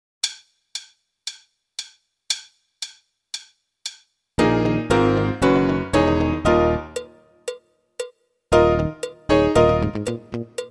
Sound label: Medium Rock; Meespeel CD